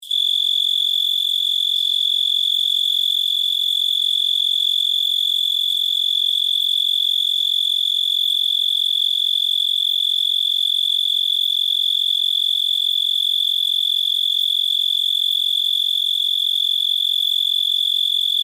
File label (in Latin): Oecanthus dulcisonans; BIOAC ORTH